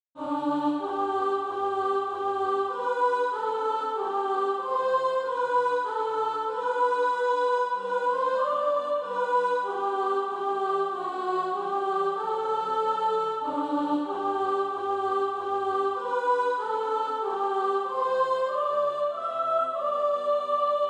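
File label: soprano, SATB Practise Music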